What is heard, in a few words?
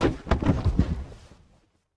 Fall Down; LOOPERS